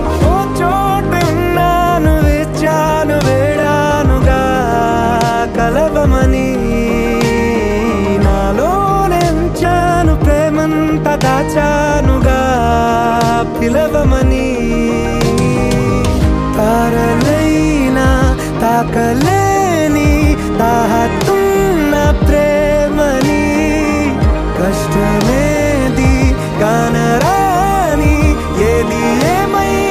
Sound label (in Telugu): Telugu Song; Telugu ringtone; Om Bheem Bush